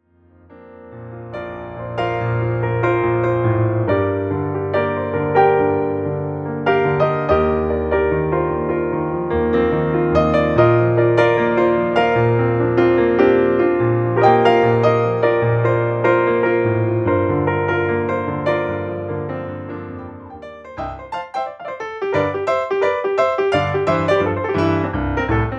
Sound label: Piano; Piano Page Sampler